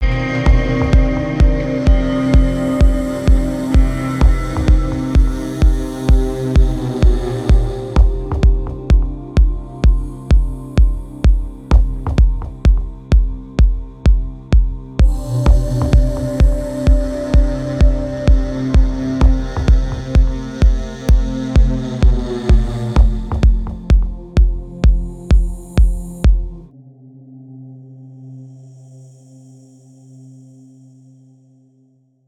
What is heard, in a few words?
Darker Somewhat Sad short end with beat; CUES for film TV games etc